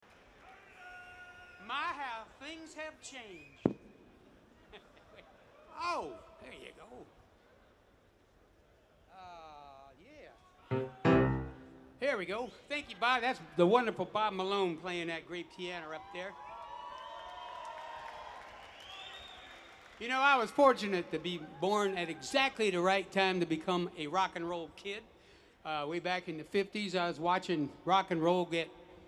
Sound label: Piano Moment